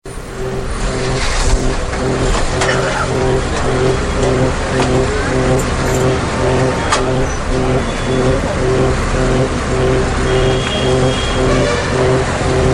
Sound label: INDIA ambient soundfield recording; soundscape